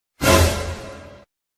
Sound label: Sudden suspense Sound